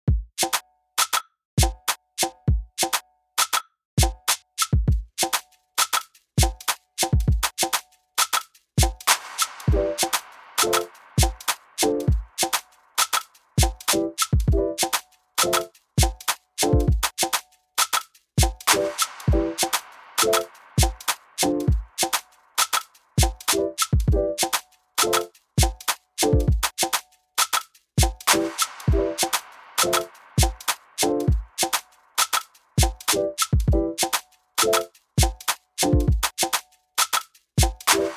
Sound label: afrobeats